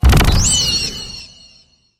Taunt - Eagle; Robocraft

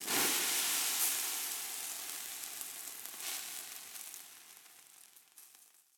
Acid Worm Hits Dwarve; we are the dwarves gamerip 2016

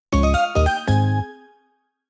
Jingle Open Story; Pokemon Masters EX

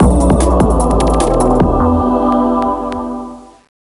Crash

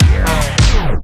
DJ Disco Stop

